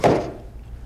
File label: stomp; grawitz